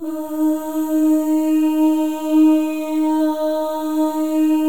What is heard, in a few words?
MOVING V1, Symphony of Voices; Spectrasonics